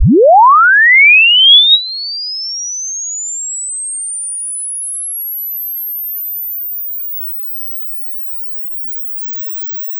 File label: sine sweep